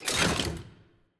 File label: Lock Success; Puzzle